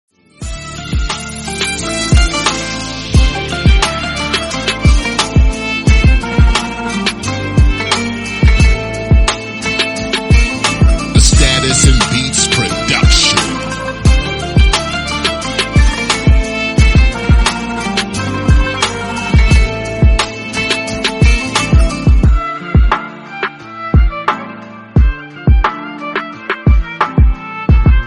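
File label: sad song violin violin bgm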